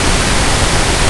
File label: Pink Noise Loop; Test Tones